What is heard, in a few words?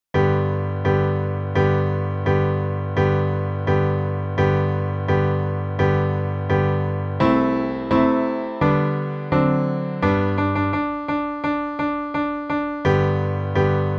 accomp; The Violin Fun Book (Level 2); Glory, Glory, Hallelujah